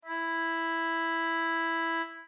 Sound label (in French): mi hautbois